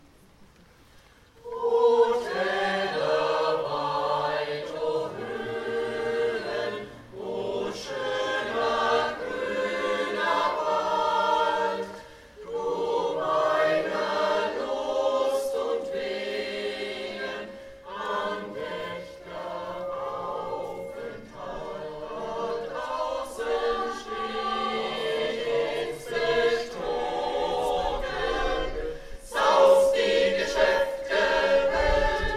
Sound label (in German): Jubilaeumschor; Felix Mendelssohn-Bartholdy; Abschied vom Walde